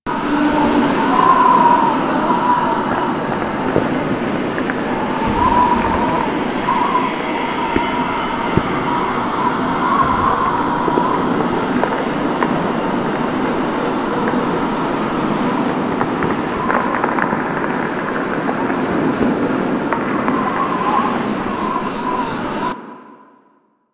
Syndicate Conclave Amb, interior ambience; Tenno Hub

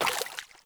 Grunt Step Water, Footsteps; Enemy; Corrupted Sproutfolk

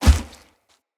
Heavy Grunt Boot Walk Veg, Footsteps; Enemy; Sinecure

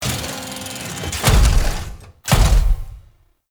Spawn Step Down; Gameplay; Nechramech Hack; Necramech Void Rig; Warframe; Entrati

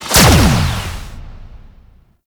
Chain Reaction Impact; Warframe; Qorvex

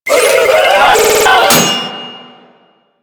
Proto Infested Volatile Runner Explosion Warning; Enemies; Abilities; Warframe

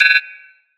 Scanner Cancel, UI; Library Scanner

